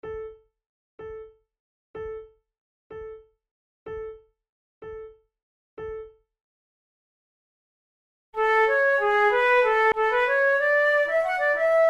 concerts for two flutes gigue a la maniere italienne; Monteclair; flute tunes